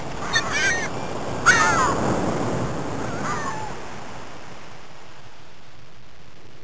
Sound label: seagulls